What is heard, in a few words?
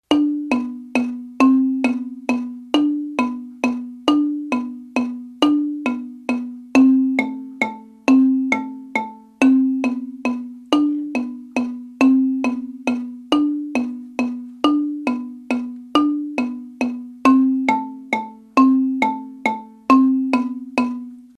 right hand, marimba; kare mugomba